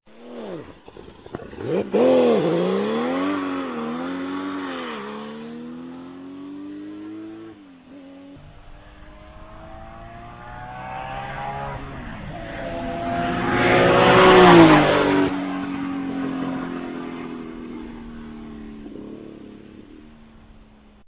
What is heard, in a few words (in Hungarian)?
Porsche 935 K3, motorhangok; autosport